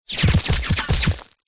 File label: bullets x7 whizz hit ground dirt stones debris close by very fast; zapsplat